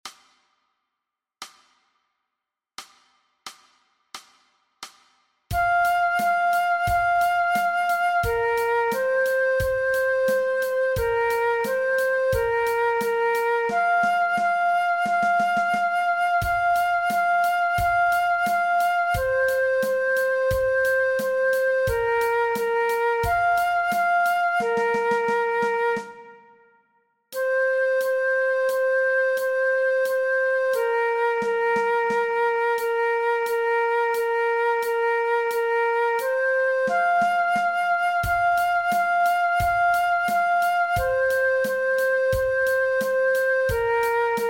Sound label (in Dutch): MEESPEELTRACK Fluit alternate; Dwarsfluit aangepast; Nij Talint Orkest